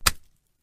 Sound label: Wet Slap